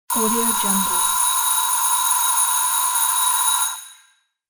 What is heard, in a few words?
isolated electric school bell; fxprosound